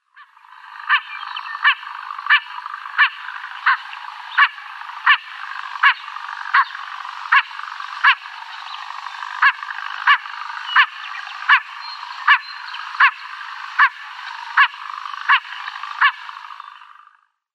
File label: Blue-winged Teal female